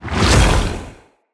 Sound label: Staff Caster Cast Short; Weapons; Staves; Dendrit Staff Caster